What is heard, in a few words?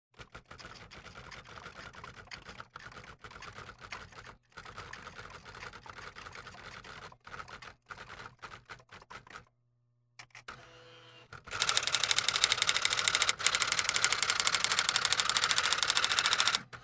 Automatic Sewing Machine